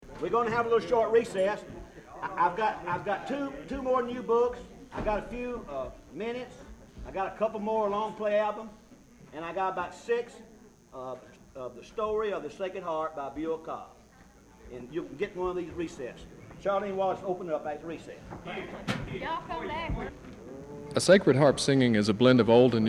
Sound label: Sacred Harp Singers; McGraw; Recess